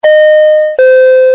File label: Door Bell